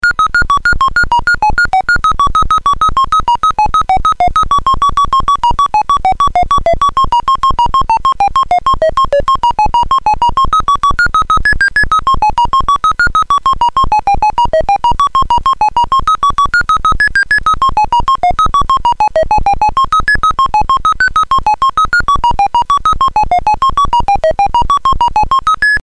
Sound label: Synthesizer, Commodore; CSG8580R5; M2 Synthesizer BASIC